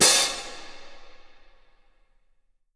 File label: CY SHORT, Percussion; Miroslav Vitous - Percussion & Harp